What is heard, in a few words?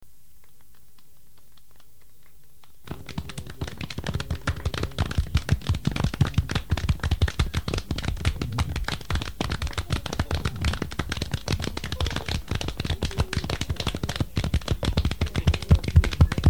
aplause